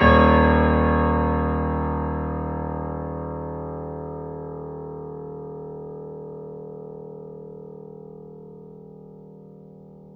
Mf D#0, Grand Piano off mic; AKAI S6000 CD-ROM - Volume 2

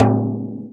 FRAME, INDIAN PERC; Big Fish Audio - Didgeridoo and Other Primitive Instruments

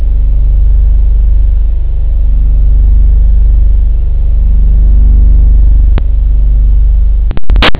voicepad; labrat; jazz jackrabbit 2

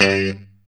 POP G 2 D; Spectrasonics; Hans Zimmer Guitars VOL-1; JC-STRAT POP

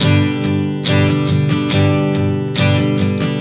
Strum 070 G, One Chord Strumming 070 bpm; Acoustic Guitar; Sonic Foundry (Sony Creative Software); Songwriter Acoustic Guitar Companion

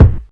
Kick, Drum Machines; 300 Drum Machines; EMU MoPhatt

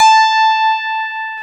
FM Rhodes; Club-50 - Foundations Roland

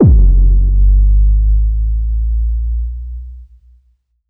EFX Humongous Hit; Polyend; Plughugger

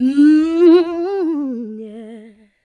VOX, VOCALS; Ueberschall; Jam Box

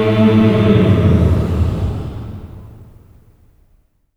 OCT FALL, voices; OCT.FALLS

